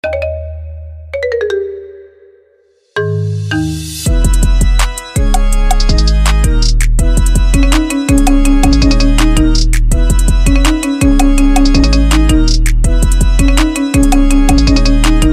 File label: Marimba